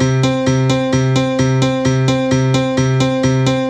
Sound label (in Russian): Dancer Piano B, сэмпл клавишных евродэнс